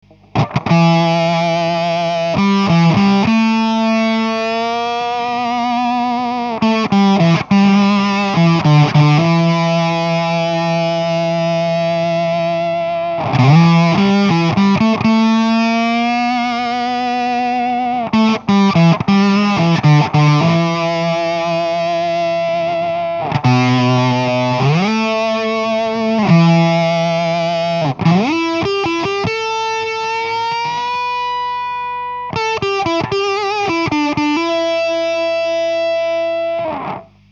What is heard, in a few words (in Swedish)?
Pearl octaver upp endast